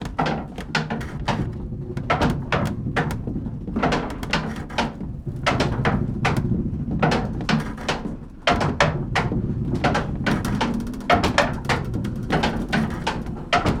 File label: INT WINDMILL MILLSTONE & BEATERS; Red Libraries